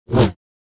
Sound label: Saber; FXHome